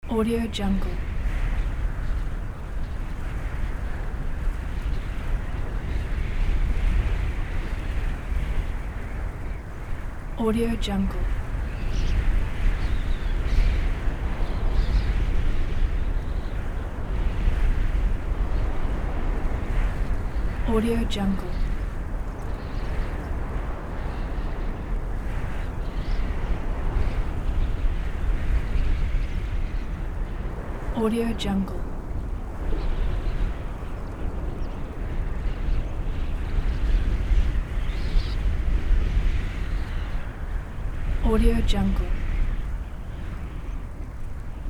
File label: wind